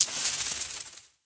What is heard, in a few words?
collect money; DragonsLucky8WD; wazdan